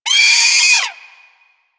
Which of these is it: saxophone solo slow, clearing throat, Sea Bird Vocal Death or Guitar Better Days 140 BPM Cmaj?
Sea Bird Vocal Death